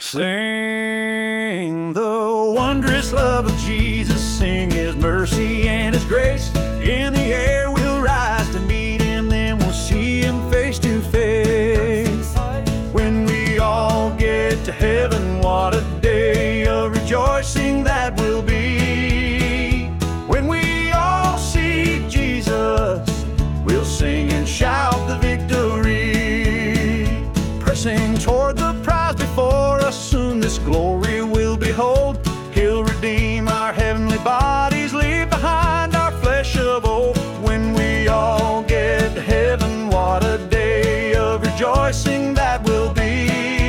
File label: Baritone Vocal, Hymn, Country Feel; When We All Get To Heaven